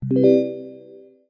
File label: logon; chat grid